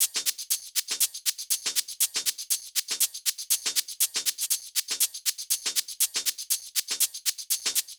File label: Sh Tm Loop 120, Hh Shake Loops, Loungin House; Equipped Music